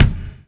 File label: stone hit; Splashdown; XBOX; Impact